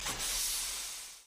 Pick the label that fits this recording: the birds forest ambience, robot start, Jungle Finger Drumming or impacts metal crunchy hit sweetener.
robot start